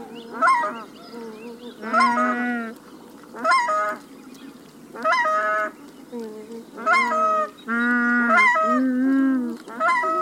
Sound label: Nene